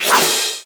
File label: Character Attack